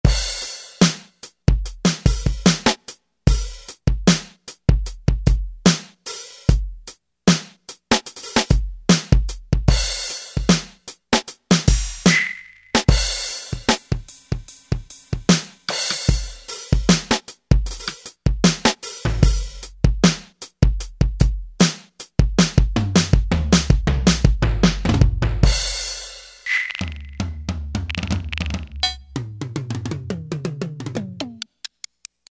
HR Kit, Alesis Z5 Vintage Synthesizers And Classic Beatboxes